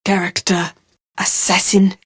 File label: death; dota; phantom assassin